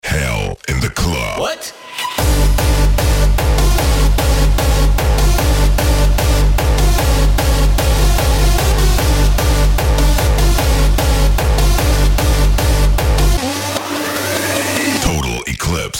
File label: Hardstyle